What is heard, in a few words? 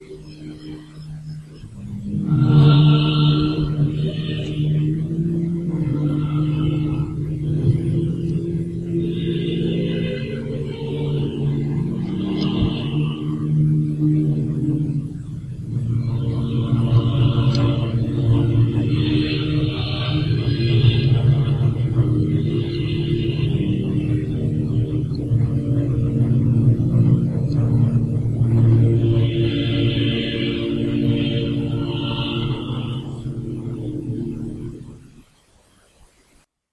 choral